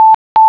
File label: Alarm; iGuidance301